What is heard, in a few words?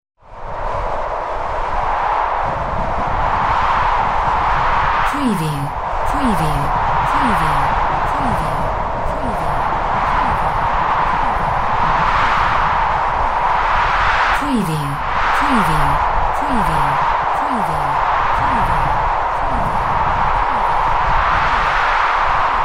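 weather wind cold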